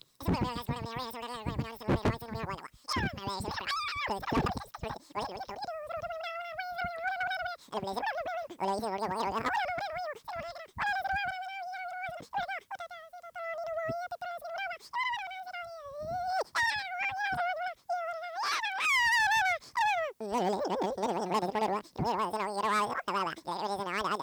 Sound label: crowd